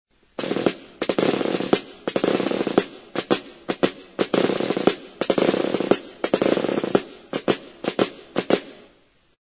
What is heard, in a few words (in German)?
Lockmarsch